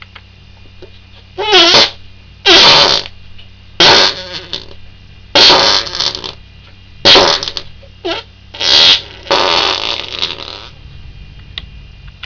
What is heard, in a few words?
fart